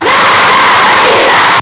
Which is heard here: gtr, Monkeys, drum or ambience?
Monkeys